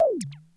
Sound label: Patooie Shrink